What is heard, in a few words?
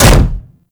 Contact Hit Effect, Vehicles; Warframe; Archwing